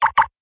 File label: Door Lock, notifications; LG G4 Stylus